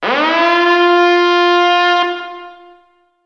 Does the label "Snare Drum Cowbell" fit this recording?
No